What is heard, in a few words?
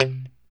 POP C 3, STRAT guitar